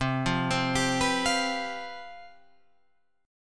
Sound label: C Major 7th Suspended 4th C down Guitar Open F; CM7sus4 arpeggiated